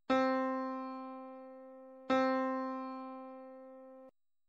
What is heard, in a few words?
c piano